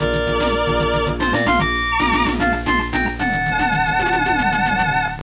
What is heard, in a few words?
Mono Stereo, Stage Clear